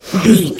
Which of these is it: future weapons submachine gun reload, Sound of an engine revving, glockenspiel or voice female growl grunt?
voice female growl grunt